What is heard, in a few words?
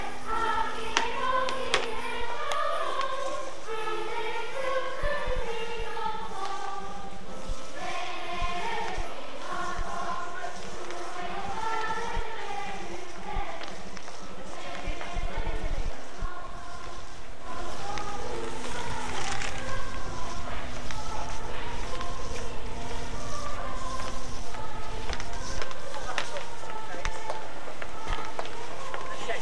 children singing in worcester at lunchtime and general ambience; including distant clock striking